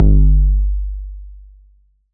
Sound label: 808's